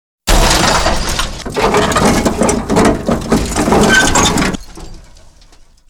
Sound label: car crash; vehicles; cars